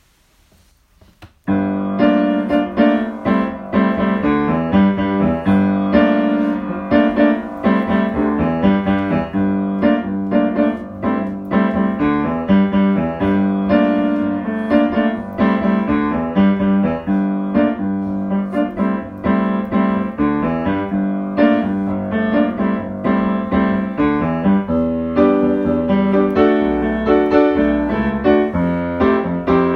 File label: accompaniment